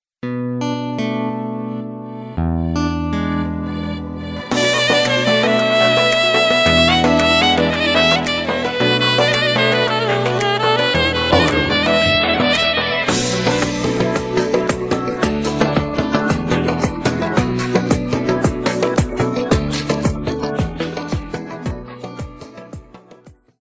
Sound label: beat, pop